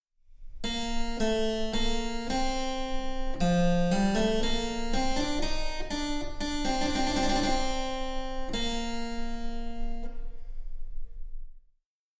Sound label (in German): Cembalo; Mark Lindley WTC 1